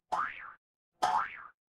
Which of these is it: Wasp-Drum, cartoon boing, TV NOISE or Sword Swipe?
cartoon boing